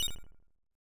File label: Collect; snes